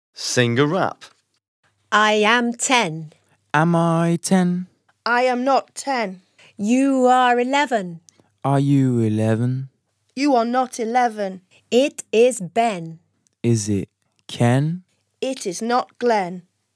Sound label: Rap song